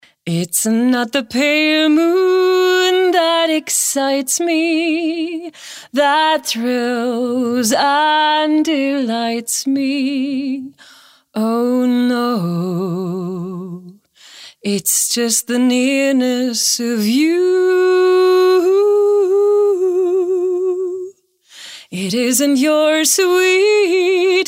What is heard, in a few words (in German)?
Gesang